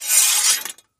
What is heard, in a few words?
undo; Connect 4